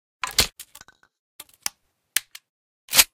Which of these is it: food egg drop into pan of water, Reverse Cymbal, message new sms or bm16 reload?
bm16 reload